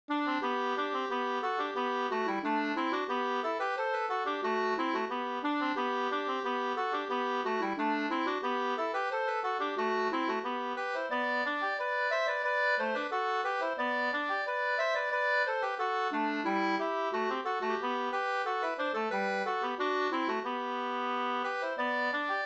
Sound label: polka